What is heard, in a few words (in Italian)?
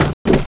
Capriola, Quando Lara rotola per terra; Il JukeBox di Lara